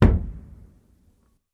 Staff Hit Single, spears & staffs

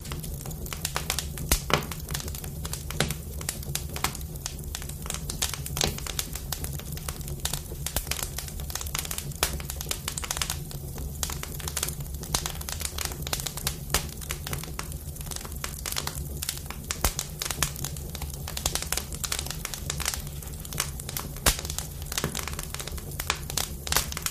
Fire Wood Stove Loop

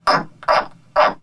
Frog California Tree

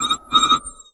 Computer Screen Goes

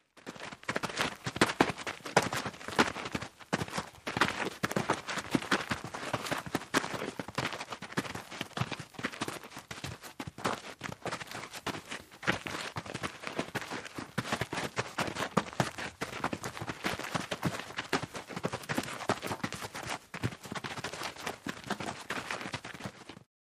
Footsteps Group Sol

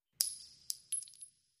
Shell Case Sngle Revb, shell casing movement

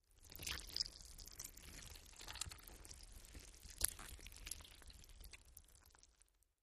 GUT SQUISH, gore

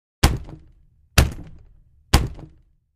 Door Knocker; knocks

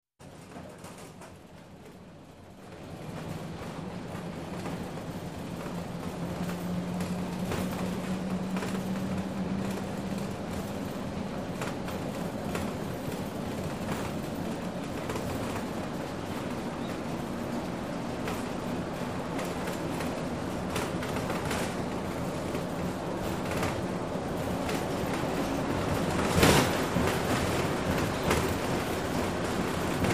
School Bus Coasting

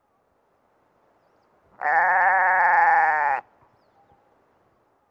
Goat Baas CU Classic; goats

